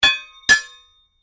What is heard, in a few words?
Strike Metal On Metal Heavy Ring Double; Foley sounds for melee weapons; Materials